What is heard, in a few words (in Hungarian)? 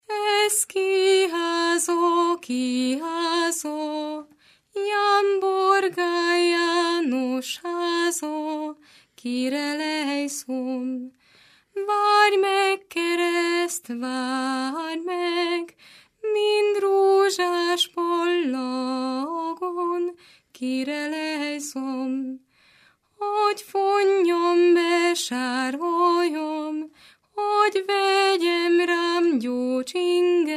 nepdal; ez ki háza